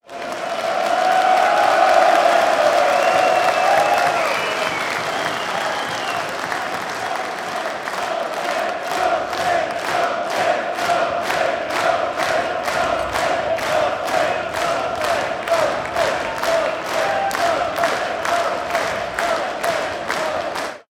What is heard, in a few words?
Massive Applause Huge